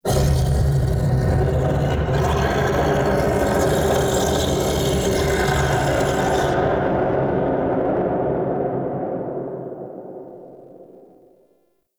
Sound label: Misc Chasm Scream A; Ambience; Warframe; Man In The Wall; Entrati